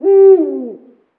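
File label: owl distant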